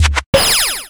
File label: Character Defence